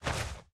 armor; smite; merlin

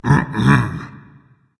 disagree berserker; cuchulainn

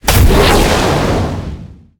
fire; smite; merlin

